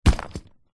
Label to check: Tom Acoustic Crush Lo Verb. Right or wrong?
Wrong